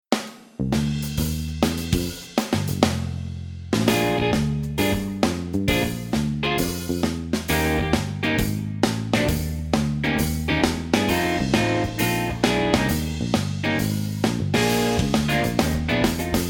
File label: Blues